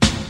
warm steel snare drum sample g sharp key, acoustic snare sound tuned to the G#